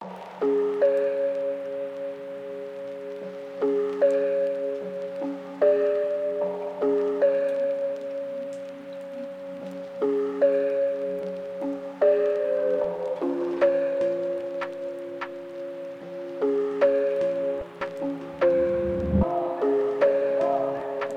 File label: phonk beat